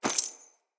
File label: Crate Coin Drop; Objects; Pickups; Neathuns